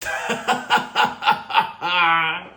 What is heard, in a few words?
laugh; chef; chef pee pee song